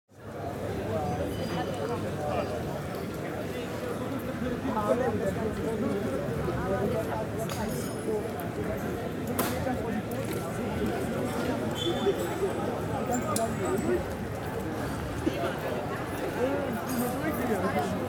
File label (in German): Gegroele